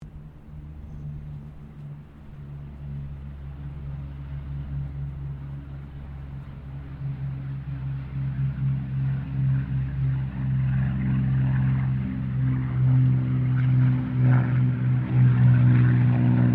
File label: AIRCRAFT HAWKER SEAFURY PASS DISTANT, Hawker Seafury fighter-bomber; Airplanes; Vehicles